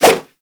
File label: Nunchaku Whoosh; Warframe; Tenno